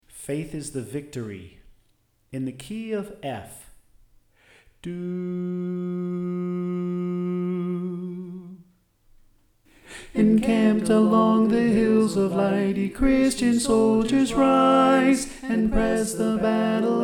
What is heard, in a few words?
Tenor